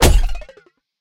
Space Train crash

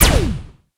hit

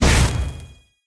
hit

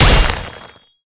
click arena

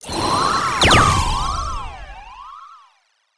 fever start; SpaceTrain

